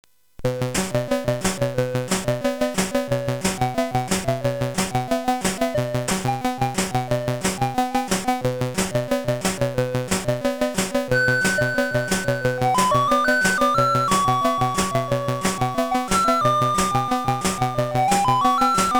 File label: SID recording Synth Sample; Rob Hubbard; Pixel Heaven 2017; Hubbard Q&A session